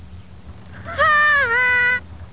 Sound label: laugh